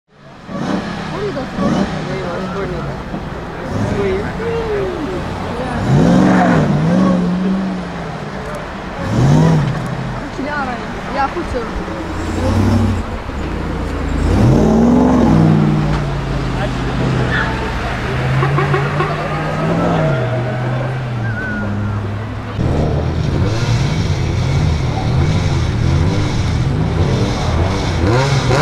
dodge ram 1500 trx 6 2l supercharged hemi v8 drag races exhaust sounds